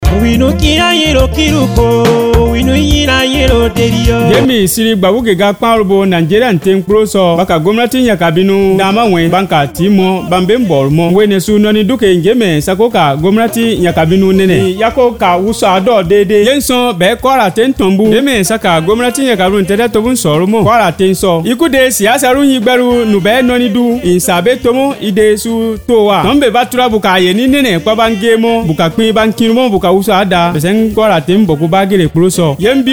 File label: Election Jingle Batonum